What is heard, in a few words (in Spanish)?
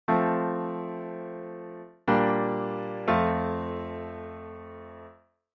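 Cadencia Plagal Ii a I, F; auditivo de cadencias autenticas plagales rotas y semicadencias; Identifica la cadencia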